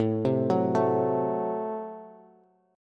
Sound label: A Minor 6th A Bass; Am6 arpeggiated; JGuitar